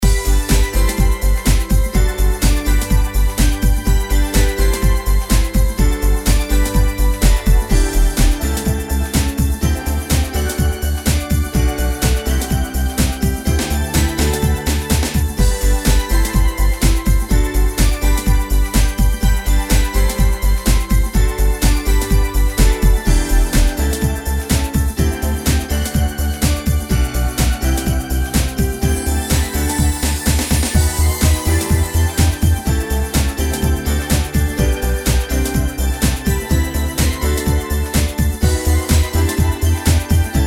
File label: BGM Bright Field